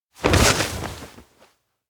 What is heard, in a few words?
fall ground three; awayuki; anemoi